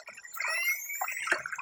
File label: Zap chirp